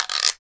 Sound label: Guiro Long, Percussion; Roland BK-5